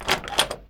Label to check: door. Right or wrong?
Right